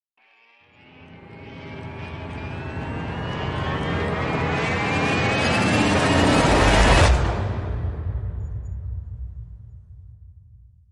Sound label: Black Burst Swell Only; Ninja Tracks